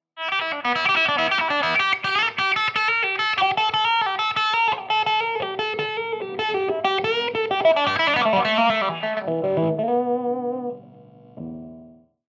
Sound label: wah